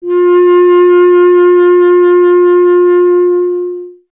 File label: B. Clarinet; Miroslav Vitous - Solo Instruments VOL-1; 90 s Sample CDs